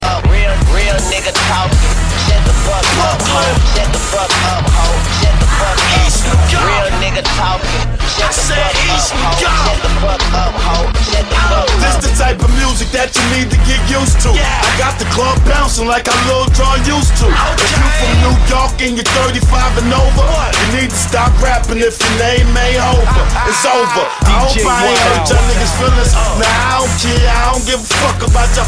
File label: hip hop